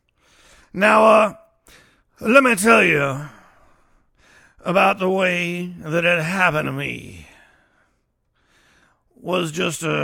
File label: Rolling Blues Monster Battle Type Thingy Vocal